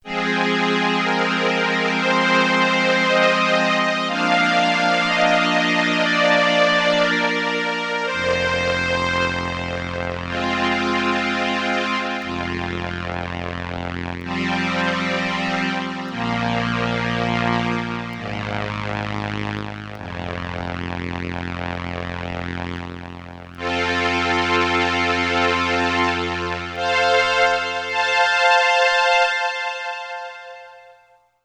BRASS with slow attack, sustain, vibrato and Ensemble I, Roland RS-202